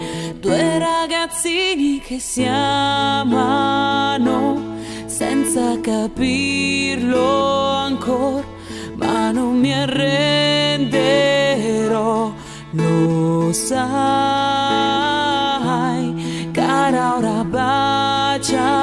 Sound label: Perfect Female Italian Ringtone